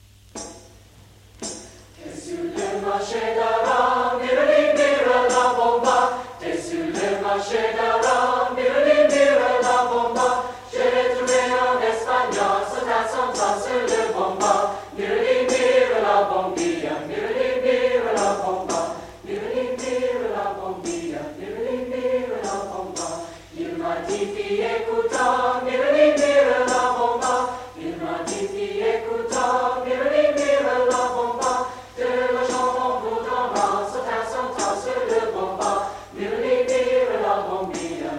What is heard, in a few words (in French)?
Chanson; ISOMATA; on Dessus le Marche d Arras